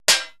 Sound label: 80s Drum Set, drum A#3; Best Service ProSamples vol.55 - Retro Sampler